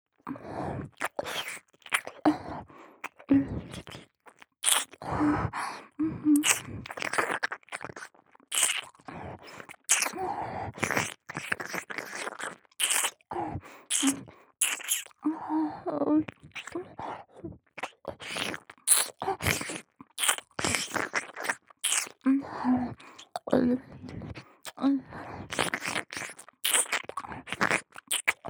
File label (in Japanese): 耳舐め・激しく, ASMR